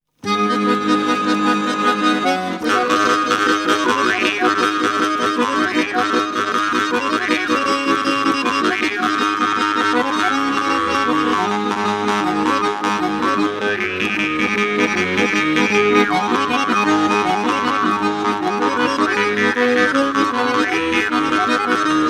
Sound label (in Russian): vargan; Altai; Tuva Mongolia